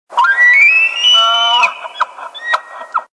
Elk Bugling

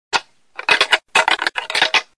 Antler Rattling

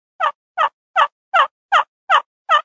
Tree Call

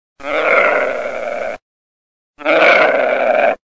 Buck Distress

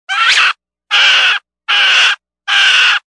Pheasant Distress

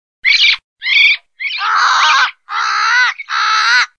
Crow Distress & Owl

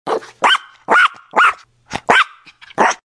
Small Dog Barking

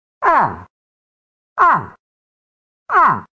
Young Moose

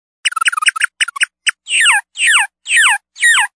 Distressed Quail

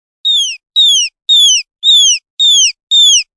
Quail Chick Distress

